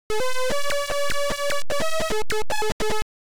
Title Beeper; Cobra

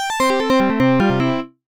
gameover; Dokidoki

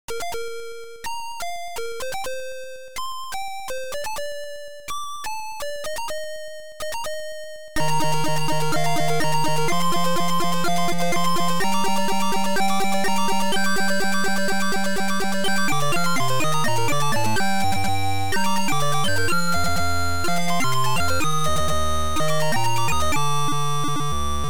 Turn the Tape Over, Beeper; Gemini Wing

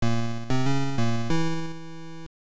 Title Beeper; Stifflip & Co

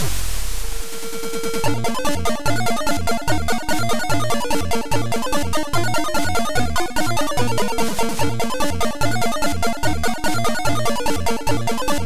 Egypt melody

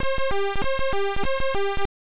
Title, Beeper; Rana Rama

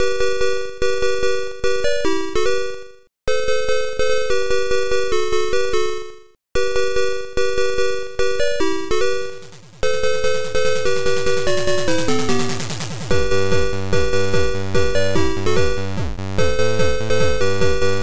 Jingle Bells

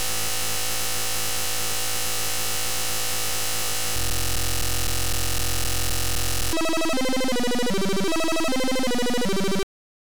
Good Luck Beeper; Split Personalities

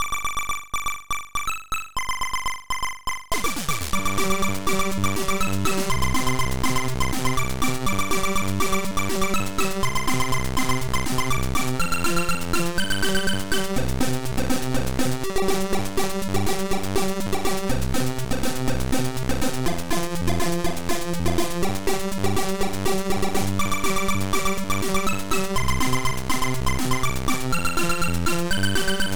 TECHNO DREAM; Fanat

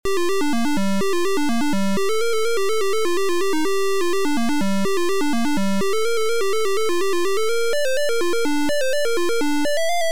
MELODY

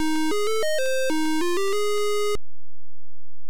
Title Beeper; Pentagram

